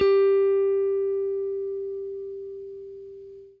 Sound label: FENDER SFT, Guitar & Bass; Quantum Leap